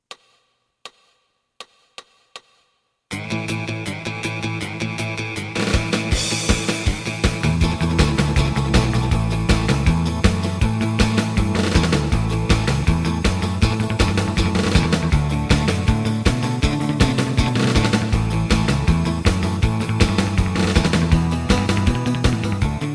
lead; エレキバンド用カラオケCD

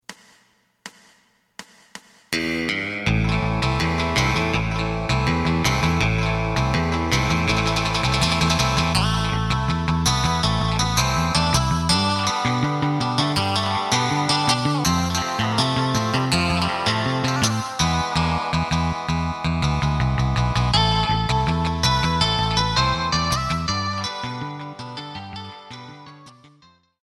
drum

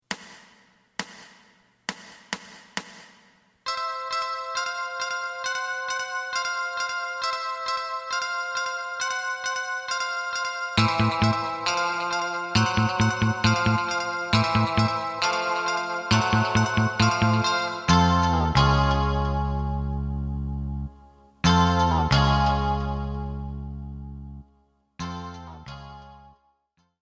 drum; エレキバンド用カラオケCD

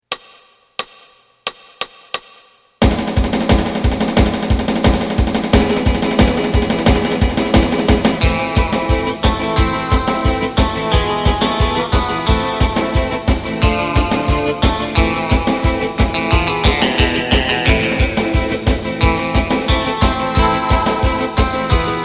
bass

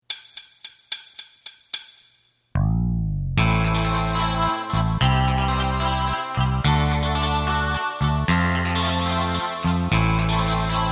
drum; エレキバンド用カラオケCD

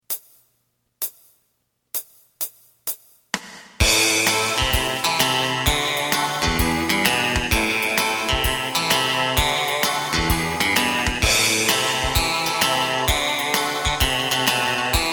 bass

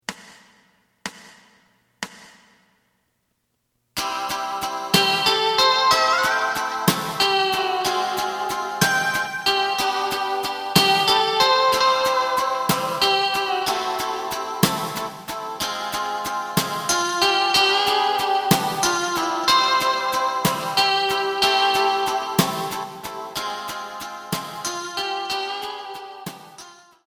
bass; エレキバンド用カラオケ

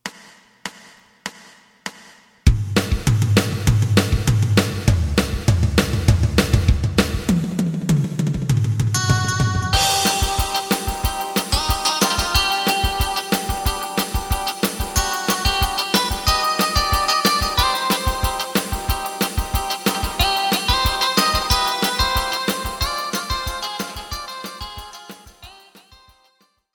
bass; エレキバンド用カラオケCD

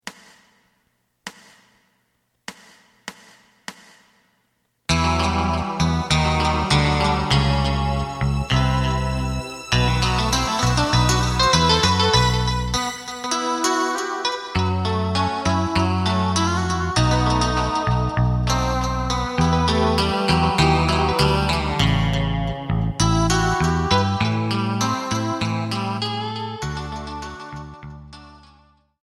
drum

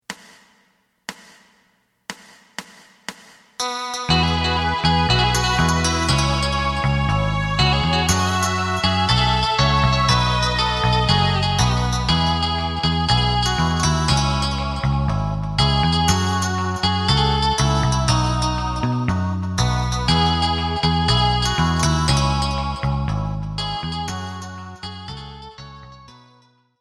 drum; エレキバンド用カラオケ

